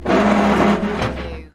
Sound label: Metal Stress - Scraping Metal Concrete